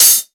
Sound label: original open high hat sound g sharp key